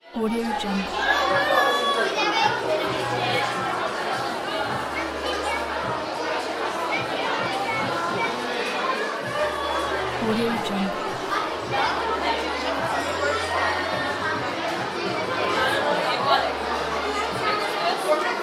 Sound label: children crowd with background